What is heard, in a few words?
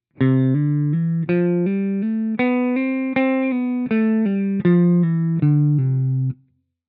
legato